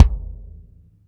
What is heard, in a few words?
BD, Bassdrums, Roland TD-20